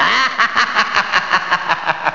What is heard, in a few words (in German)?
lachen